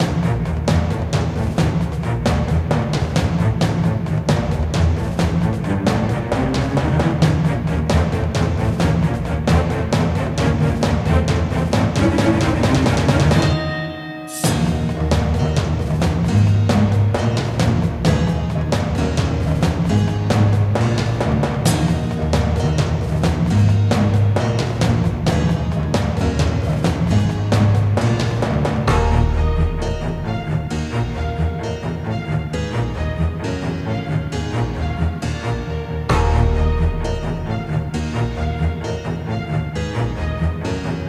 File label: Emotional Bossfight Stolz